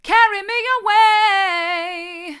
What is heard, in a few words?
carry me away hard, vocal